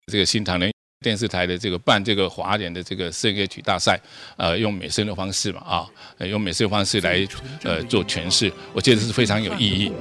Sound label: Vocal Music